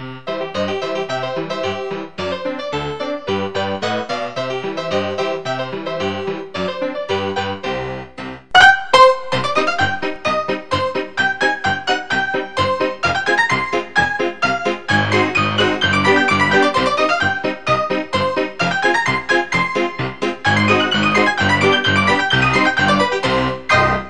Piano Happy Ringtone

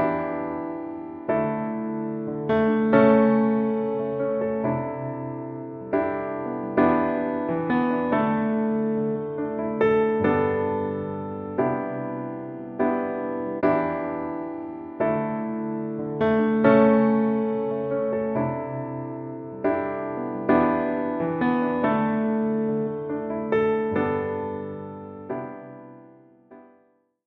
Piano Morning Ringtone

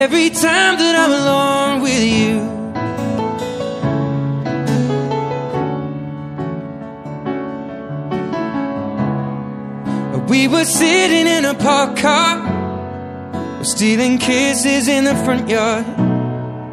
English Ringtone

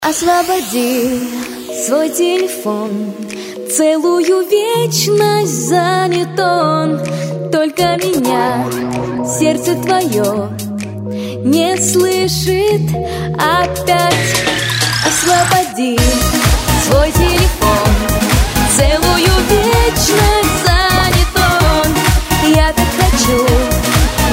Ringtones; Samsung

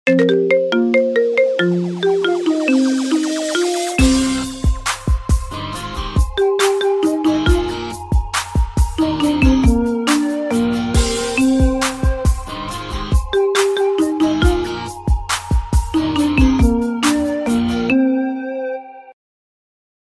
Iphone Note

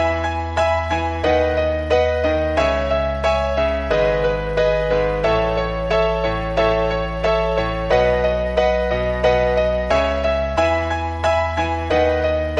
Piano Canon Ringtone

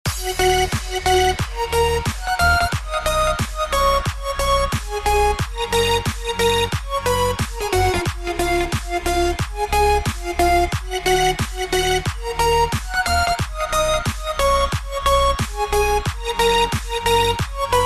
Pianno Remix Ringtone

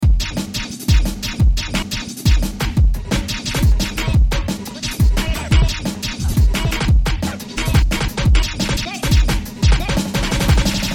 New English Ringtone

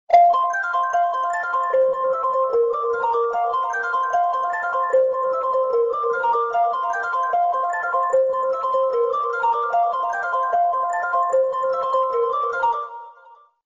helium Ringtone; Iphone 6s

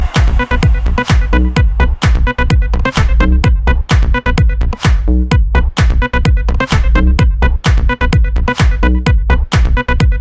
Ringtones; Samsung

